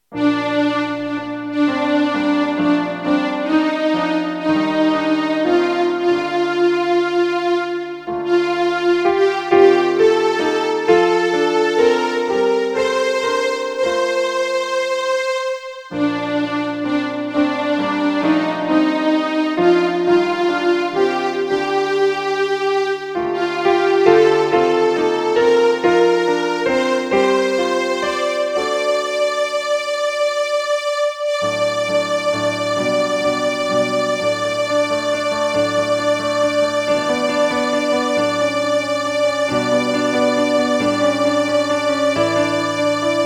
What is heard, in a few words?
SOPRANO 2 instrumental